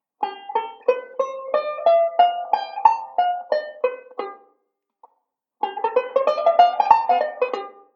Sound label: Modal Sequence, banjo; Beyond Chord Melody Volume II